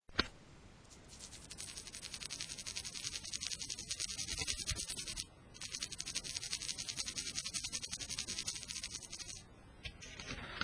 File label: Buzzy Pad, clarinet